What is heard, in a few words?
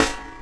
SD, Drum & Bass, 160 BPM; Ueberschall; Drum & Bass Resonance